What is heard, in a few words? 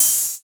Open Hat; Klank; Cymatics; Drum One Shots